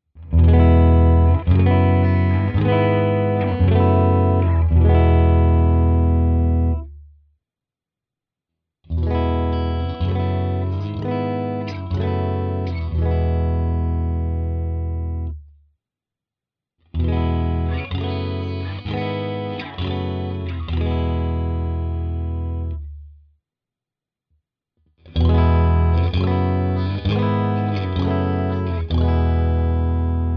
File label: clean all positions; AZlean